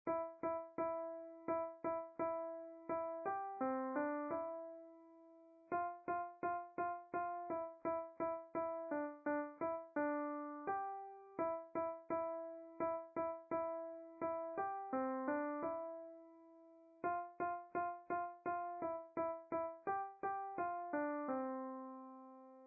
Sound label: Legato Staccato Jingle Bell, beginner piano music